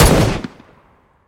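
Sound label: precise booming shot from a short-barrel weapon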